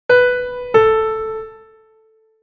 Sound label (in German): Intervalle sukzessiv Beispiel